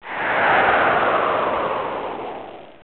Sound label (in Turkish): giden rüzgar; doga